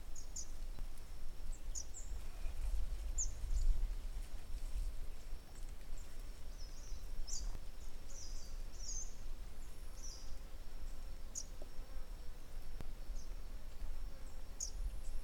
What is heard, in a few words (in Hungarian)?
vorosfeju oszapo hangja